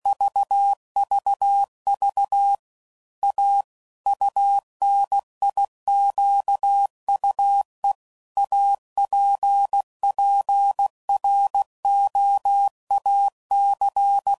Gates Intro, CW Code Groups 15WPM; Morse Code; HamRadio

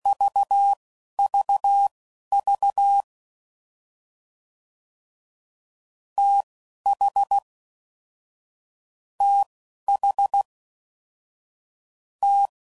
TH Prefixes, CW Code Groups 10WPM; Morse Code; FLs & THs

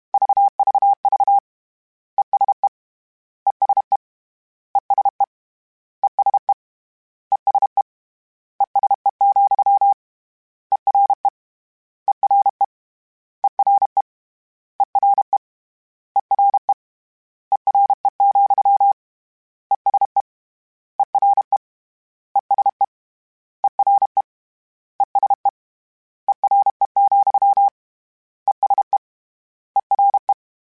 CW Code Groups Suffixes, ESE ERE

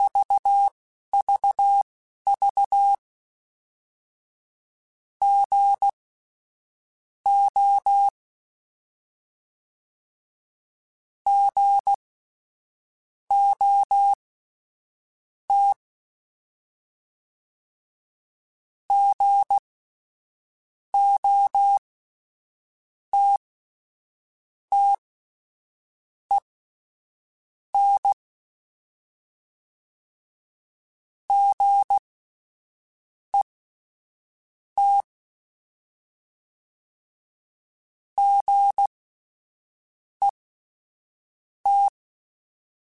Morse Code, CW Code Groups; Mixed

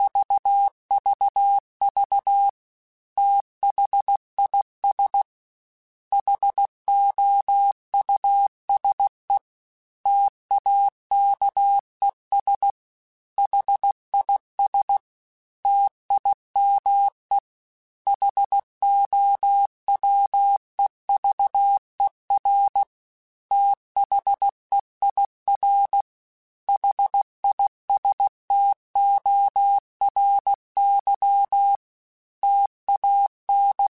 T then H Runs, Morse Code; HamRadio; Prefixes

